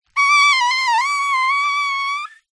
leisure inflated rubber pool toy rub ground squeak